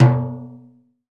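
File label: open hand, timbales; E-MU Producer Series Vol. 2 – More Studio Essentials; Composer; Timbales Xswitch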